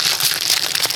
Rip Tear Flesh

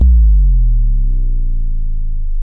Sweep

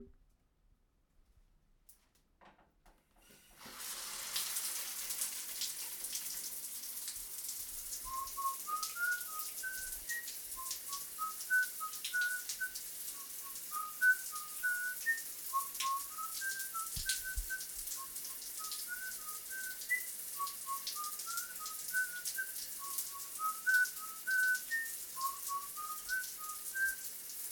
Whistling In The Shower

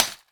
Metal To Glass Broke It

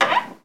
Wooden Door Squeak

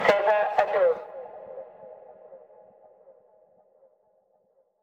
Sderot Code Red

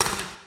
Paintball Shoot

